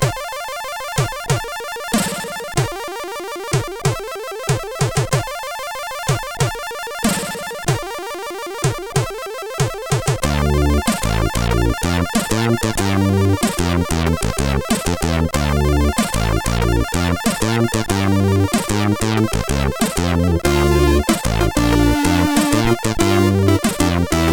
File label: sid, CSG8580; Visage